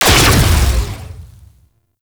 Modular Secondary Barrel Fire, Weapons; SU Modular Guns; Barrels

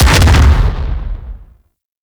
Step Metal; Warframe; Teralyst; Ropalolyst; Steps

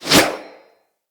Axe Small Swing; Weapons; Swings

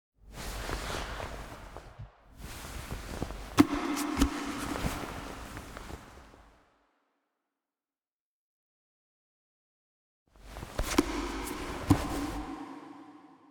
Operator Pick Up Helmet, Foley; Apostasy Cin B Operator; Warframe; Cinematics

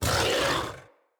Kubrow Feral Vocal Angry Attack Short

